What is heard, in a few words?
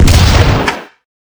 fire grabber, handcannon; midnight guns